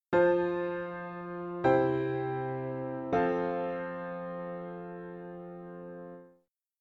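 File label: piano, dictation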